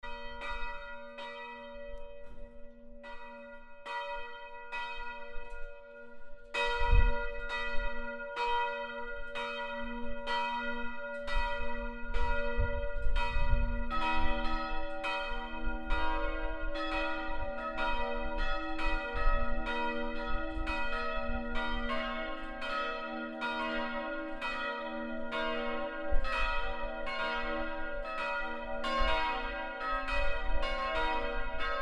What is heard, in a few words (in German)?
Kirchenglocken